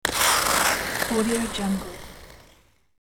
ice skating toe pick; hollywoodedge

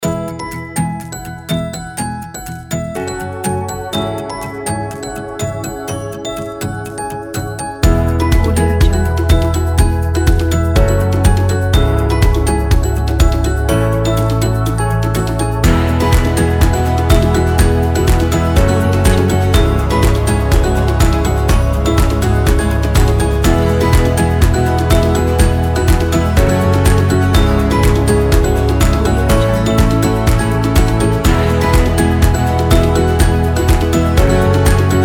happy

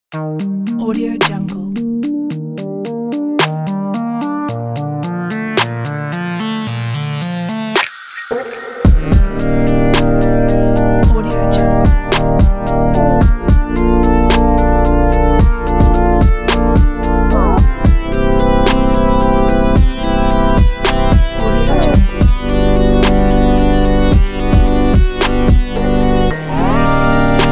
abstract chill hiphop

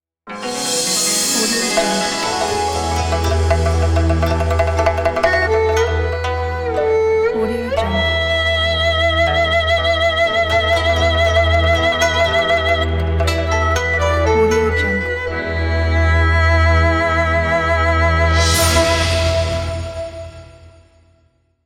asian logo

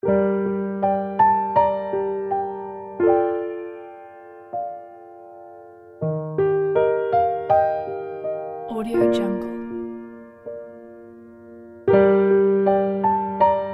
sad melancholic piano music

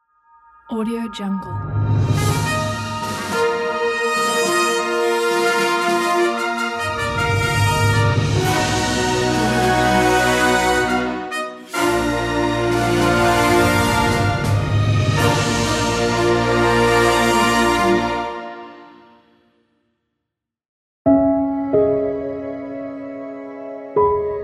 ident and logo pack

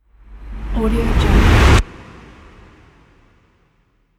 airy swoosh

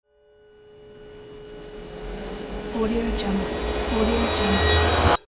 riser stinger; eytan k

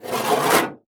scrapes and scratches

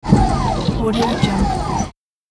space ship door open or close

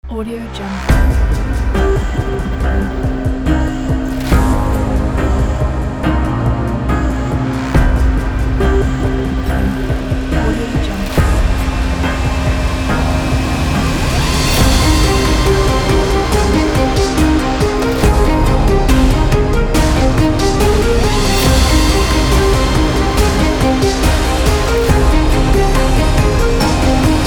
dark angry trailer

